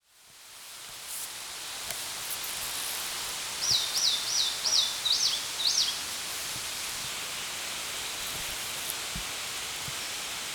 sendaimusikui, bird song; センダイムシクイ; 特殊声; 福島県只見町 浅草岳登山口

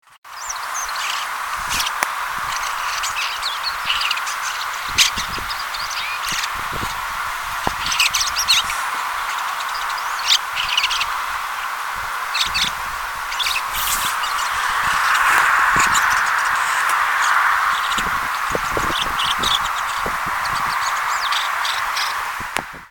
mukudori, bird song; ムクドリ; 地鳴き②; 新潟県新潟市秋葉区 田んぼ

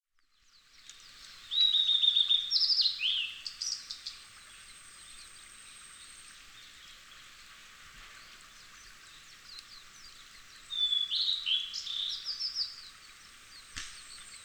ooruri, bird song; オオルリ; さえずり③; 新潟県新潟市秋葉区 菩提寺山

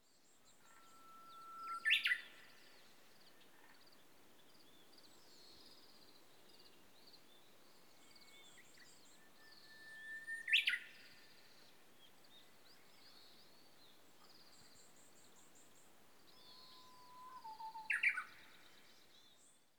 uguisu, bird song

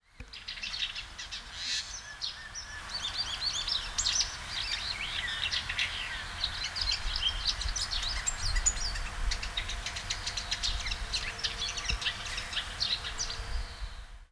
komukudori, bird song; コムクドリ; ぐぜり; 新潟市中央区関屋 海岸林

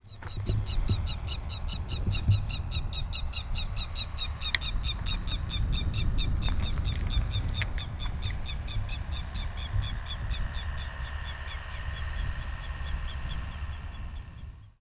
keri, bird song

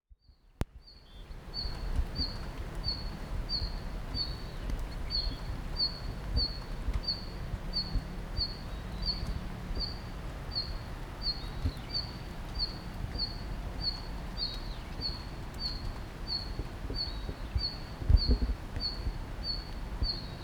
ruribitaki call; ルリビタキ; 新潟県阿賀野市出湯; bird song; J-eco 鳥のさえずり図鑑

